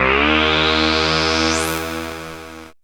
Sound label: syn d#, Dance Synths, Juno; Juno Jam